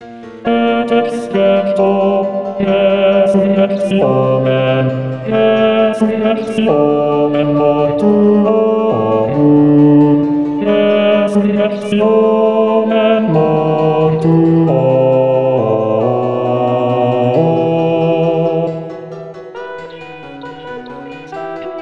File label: bass et resurrexit